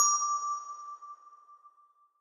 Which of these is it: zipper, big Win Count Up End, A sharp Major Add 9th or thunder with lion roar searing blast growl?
big Win Count Up End